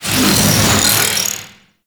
wave ability proj by; Enemies; Abilities; Warframe; Duviri; Dax Knight

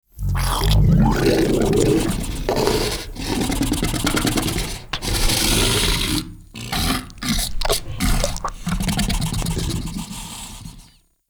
Ancient Tank Idle, Enemies, Infested, Barks; Warframe

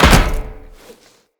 Tenno Land Heavy Metal Perforated, Footsteps; Characters

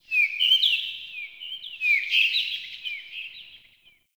Forest Open Glade Bird Med Two, Day; Ambience

